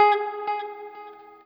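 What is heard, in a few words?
noodle short, guitar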